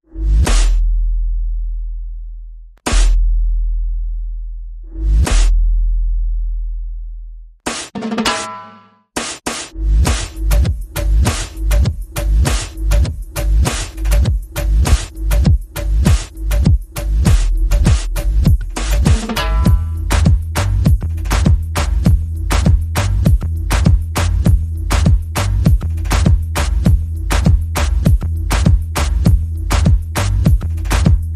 Bass Perc and FX Only

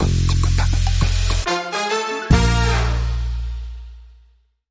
Bumper

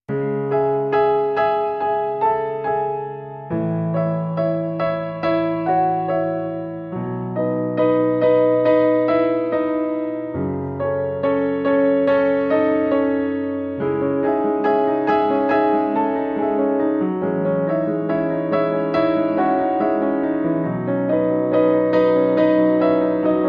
Piano Solo

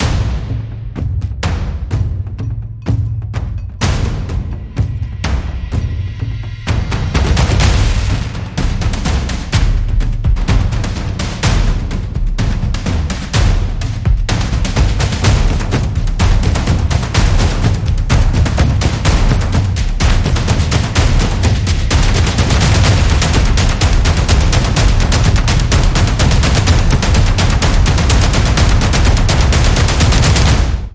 The Prey, Percussion

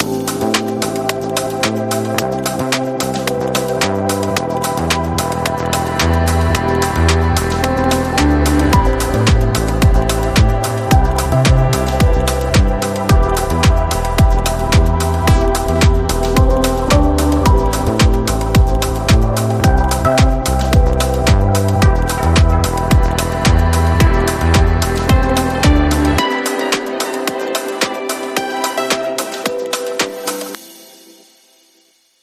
Alpine Ambience

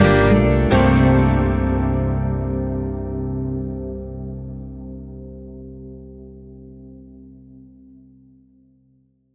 Winter Sting

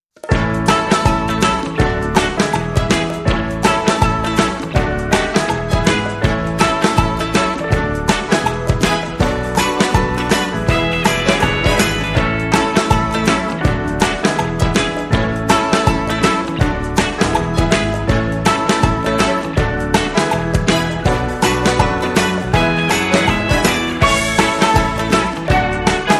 No Solo

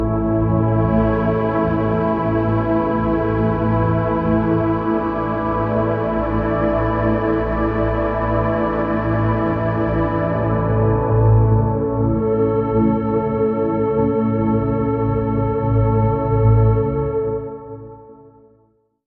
Atmospheric Happy Drone; 15sec

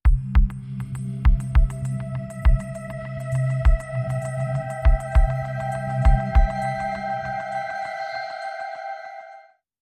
Beat Sting

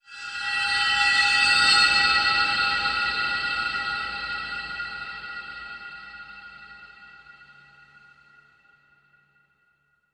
Sting Metallic Glimmer

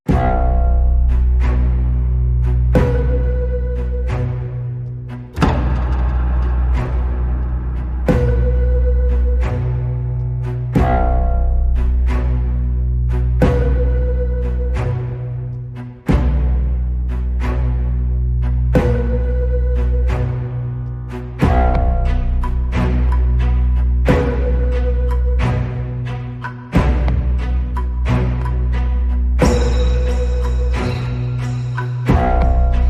No Low Piano

